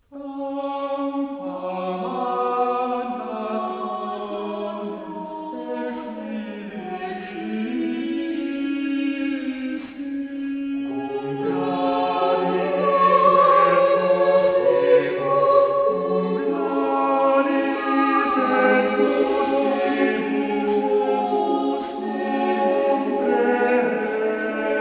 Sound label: Choir